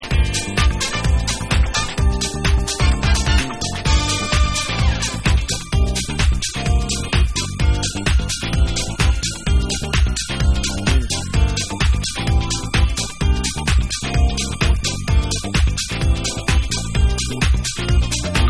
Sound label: Jazzfunkinstrumental